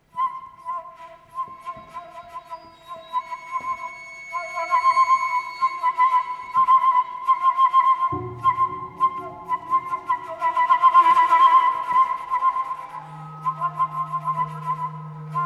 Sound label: Flute